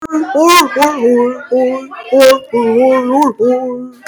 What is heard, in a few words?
harhar